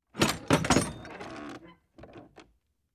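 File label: Heavy Metal Latch Open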